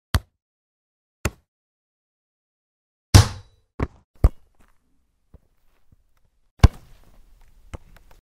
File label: rugby ball